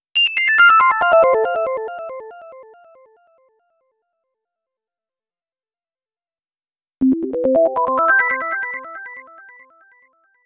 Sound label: Sine Synth, 140 BPM; Vengeance Samples; Song Kits; Take Me Away